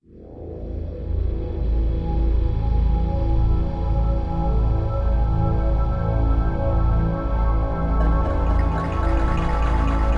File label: Dungeoneering Floor Track